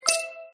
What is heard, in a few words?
coin; 2048